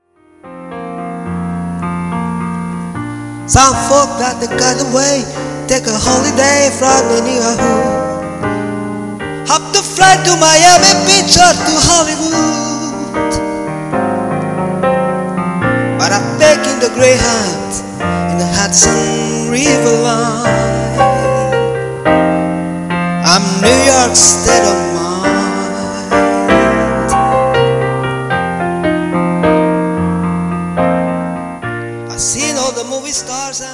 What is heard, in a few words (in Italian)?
piano bar